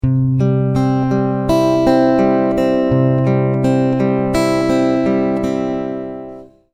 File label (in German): zupf